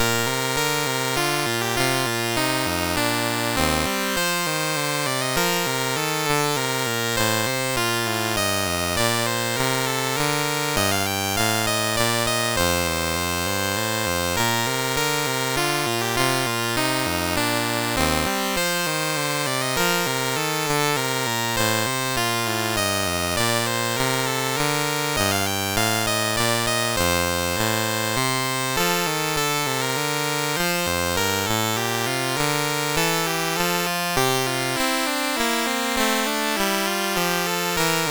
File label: Minuet in B Flat, MOS6581R2